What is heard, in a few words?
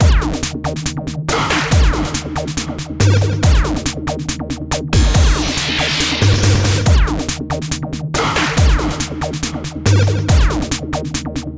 Gaming Circuit Beat